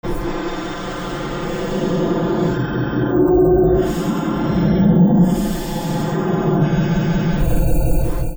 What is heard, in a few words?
SCIFI